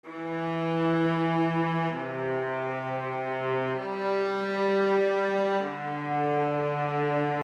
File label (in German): streicher langgezogener ton